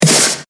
Snare; BorderLand

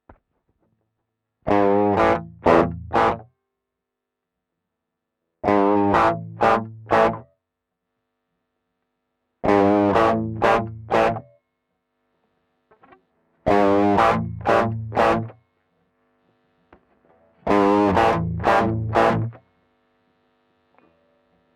ts-808 gain up, Tube Screamer; ibanez testbericht

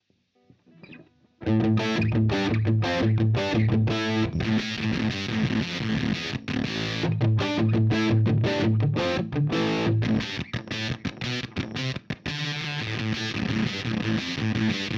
Walrus Kangra fuzz modern; Walrus Audio

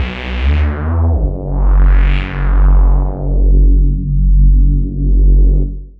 bass; best service nitron; bassmas

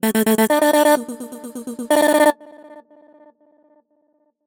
Stutter Fx; Zero-G Vocal Lix

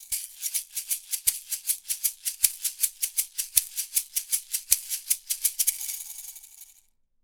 miktek mk300 shaker

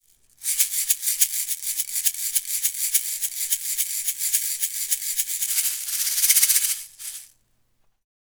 Fame Studio CU2 test shaker

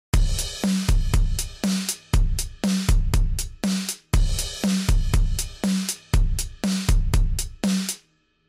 drums; butch vig

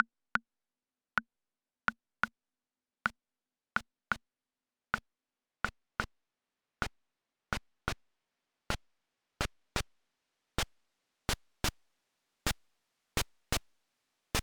percussion, Akai Rhythm Wolf

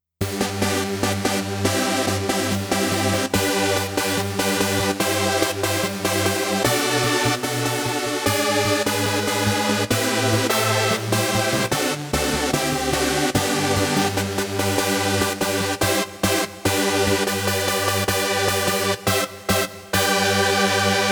va synth; Casio PX-S3100

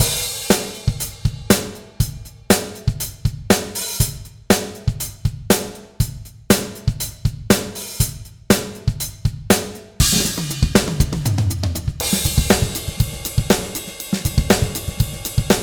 drums chamber; fabfilter pro-r

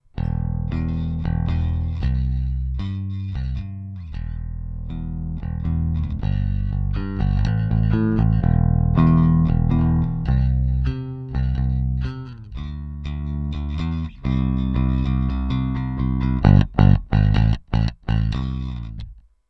equalizer sweep middle; Trace Elliot ELF

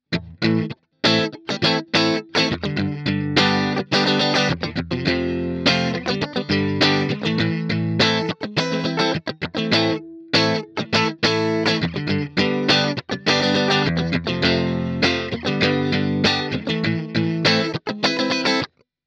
MV50 AC dirty; vox

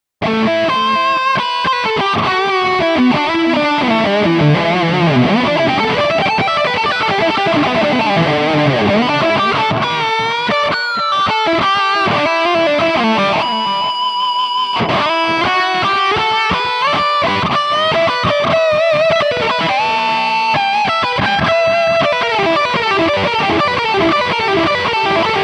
harley benton dnafx git

